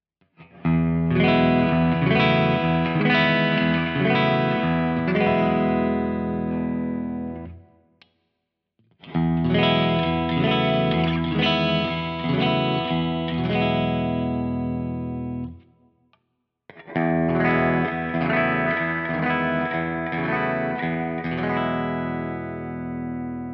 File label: Jazzmaster clean Bright Chords